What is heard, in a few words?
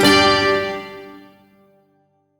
Shawzin Chromatic Chord; Warframe; Duviri